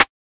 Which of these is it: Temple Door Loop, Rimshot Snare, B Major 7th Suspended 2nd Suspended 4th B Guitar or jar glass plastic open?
Rimshot Snare